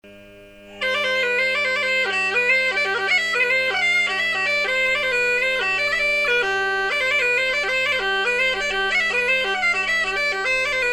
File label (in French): tambourin, cornemuse